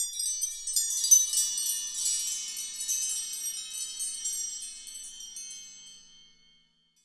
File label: percussion bells